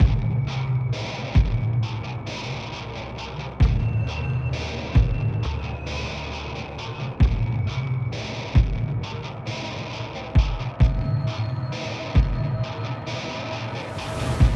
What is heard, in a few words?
Horror Elements